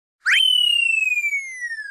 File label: fall off; mario kart